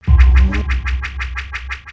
battle item Shield Group

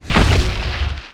Tunnel Out

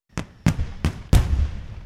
Fire works multiple

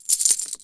ui Shop Buy

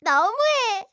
voice Angry

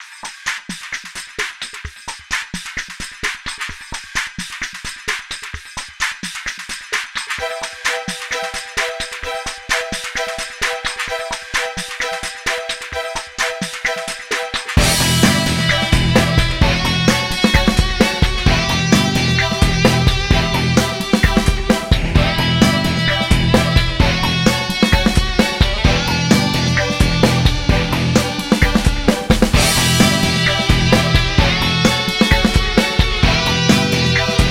battle mode

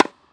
ball unbalance

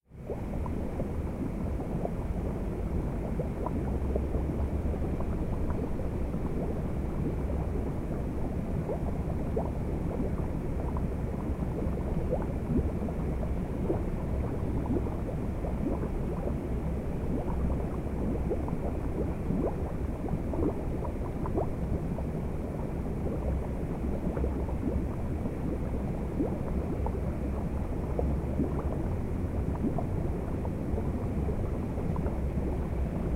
ambience Lava

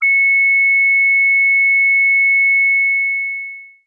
flash; battle item Apollon

